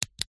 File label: office pen retractable click; zapsplat